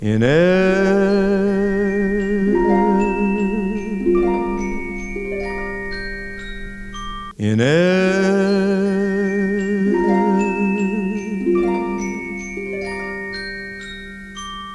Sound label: Glockenharp; MFSL